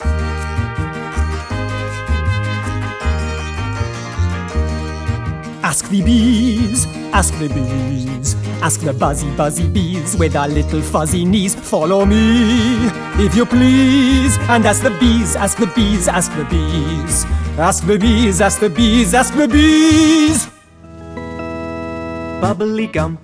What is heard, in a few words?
animation singing